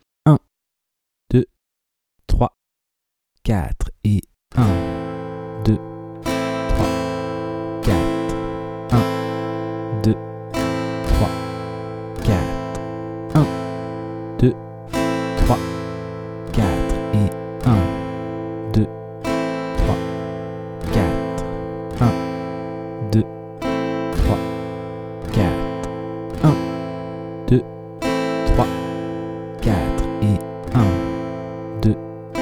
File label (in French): lent travailler la rythmique; De 0 à vos premières suites d'accords